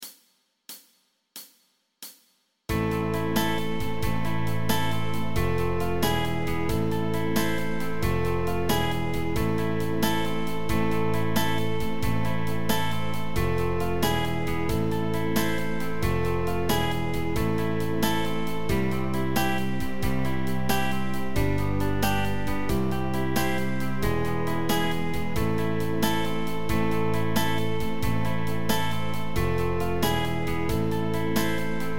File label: Folk Backing Track